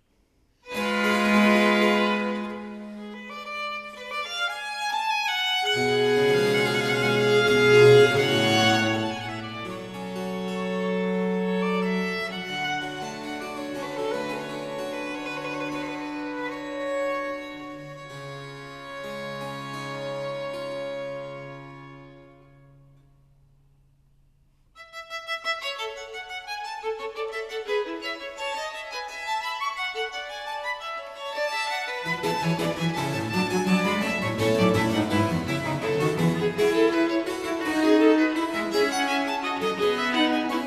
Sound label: Sonata No. 7 in B flat major (Adagio - Allegro); Haendel; Sonate a trio op. 5